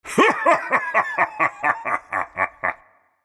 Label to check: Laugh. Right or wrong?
Right